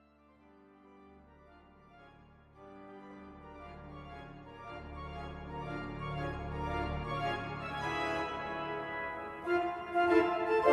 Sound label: Fanfare